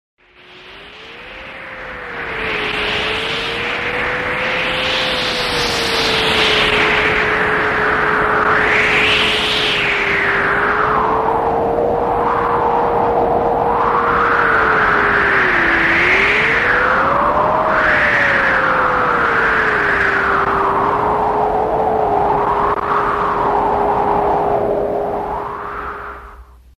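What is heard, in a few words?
blizzard noise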